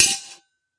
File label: Coffee Pot Scrape; ModeAudio Found Percussion Hits